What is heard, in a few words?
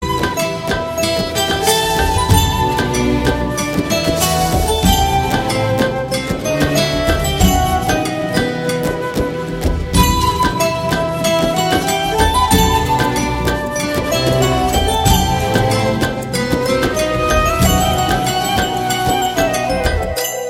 tone